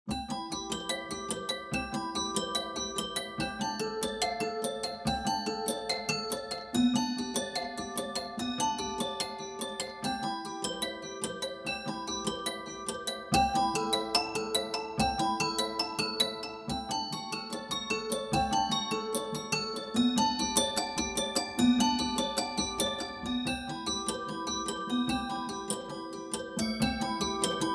tiny grand; Embertone; Bach

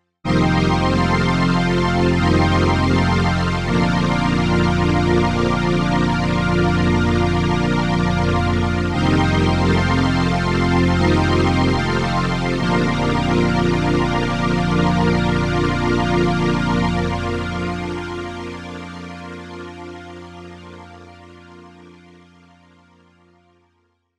mixed pad; stringer

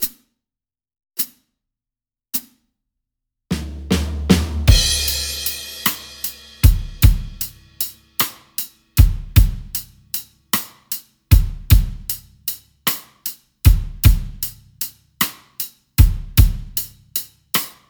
variety pack blues

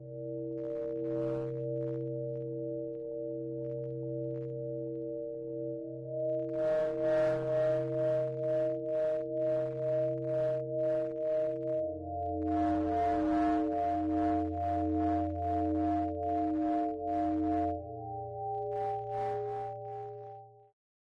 atmos etherphone particles

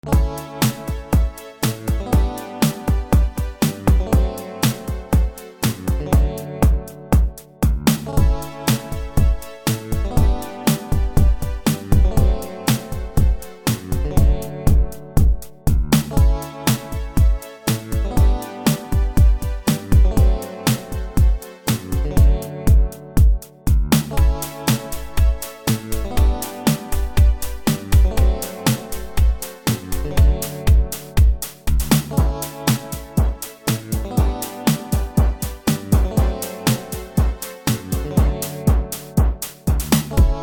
acoustic kick

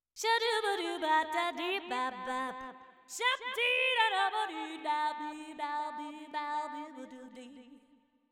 vocal add depth

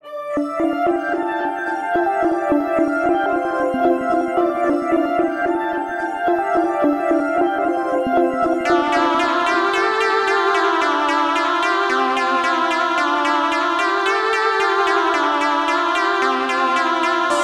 poly synths

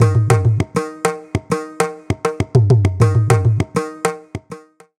mridangam

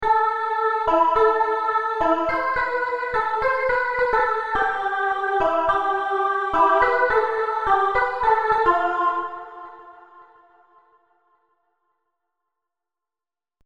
serum synths; chiqui audio

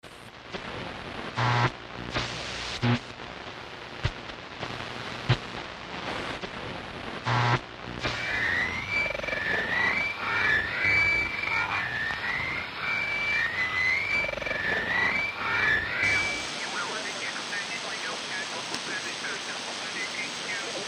old radio

